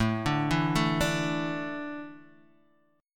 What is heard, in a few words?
A Suspended 4th, Guitar, Standard; Asus4; arpeggiated; 5,5,2,2,3,x; JGuitar